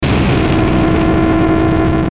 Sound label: Distortion Descend Buzz; Distortion Descend Buzz Hum; Descending; Blastwave